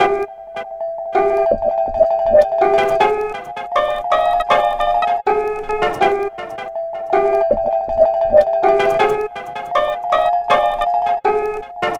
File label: Melody, Hip-Hop; Sonic Foundry (Sony Creative Software); Lukecage Underground Soundlab Hip-Hop